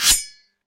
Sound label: sharp metallic swish